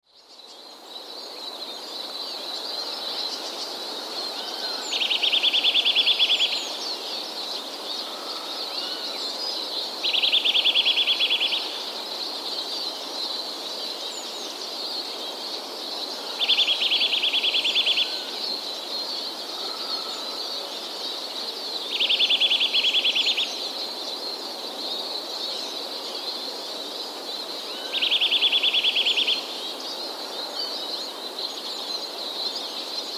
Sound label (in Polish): kowalik jeszcze szybsze tryle; szybkie tryle, gdzie niektóre gwizdy brzmią nieznacznie wyżej lub niżej, przez co tryl brzmi troszkę melancholijnie